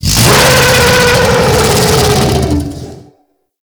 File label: Close Scream; Warframe; Tau; Sentient Vessel